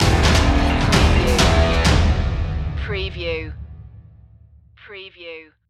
stock market crash stinger

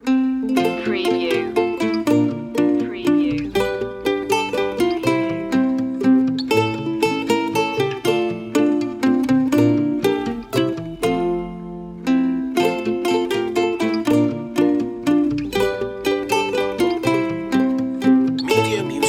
we wish you a merry christmas ukulele

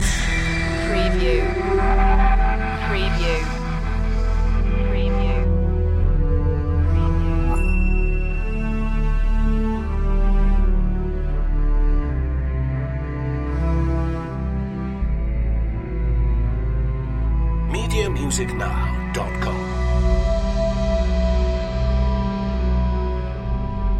forest fantasy loop

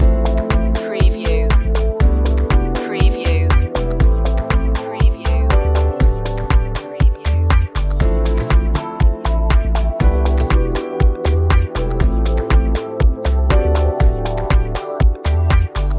loop, chill

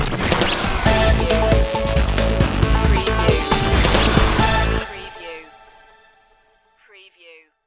diy sting

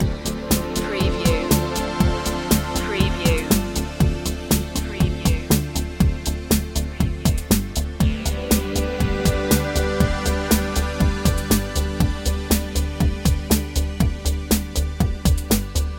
analogica loop, chill

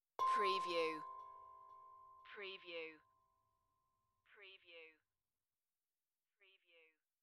Tongue Drum Meditative Tone; beanstalk audio

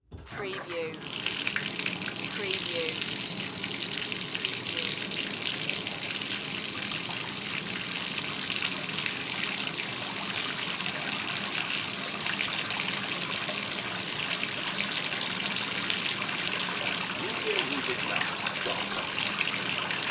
Bath Tub Run Water; sound effects bible

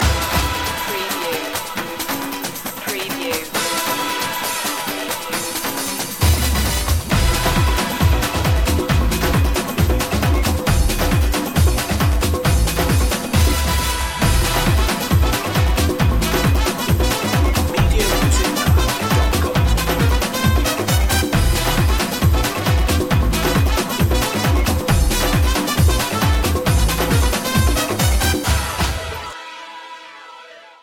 candombe

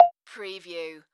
Multimedia Percussion

